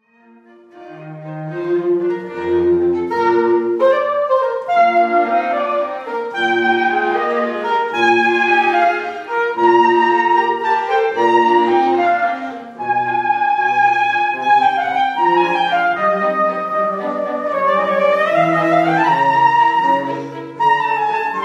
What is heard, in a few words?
Oboe Quartet; Wolfgang Amadeus Mozart; Oboenquartett KV 370 3